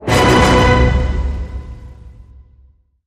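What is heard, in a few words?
Hit Orchestra Triple B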